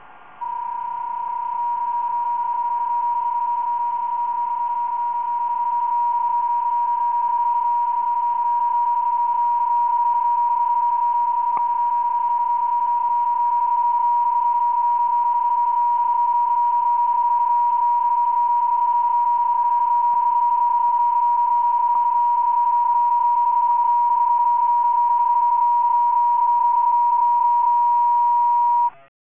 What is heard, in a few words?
PSK31 BPSK